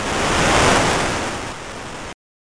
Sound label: rain